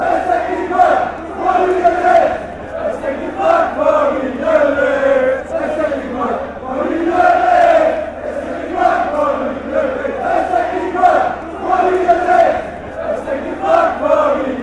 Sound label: beerschot chant